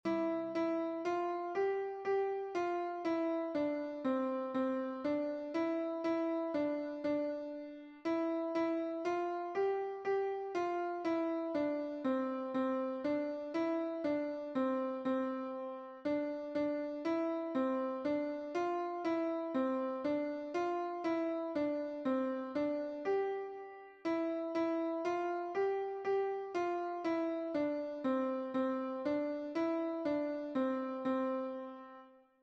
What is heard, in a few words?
Ode to Joy Piano